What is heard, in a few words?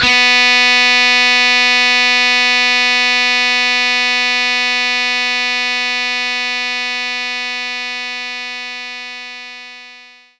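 Distortion Guitar B3; knights try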